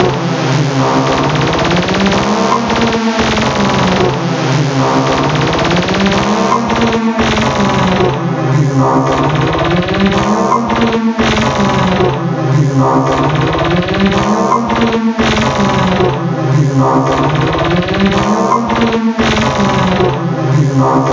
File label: air raid siren